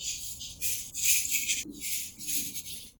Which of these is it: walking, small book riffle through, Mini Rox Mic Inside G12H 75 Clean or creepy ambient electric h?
walking